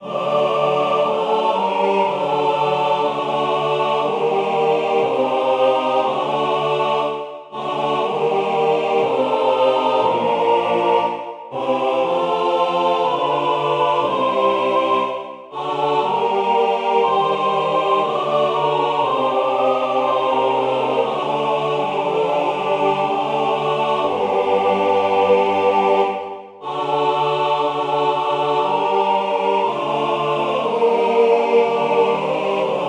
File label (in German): jungfrau tiefe stimme, korus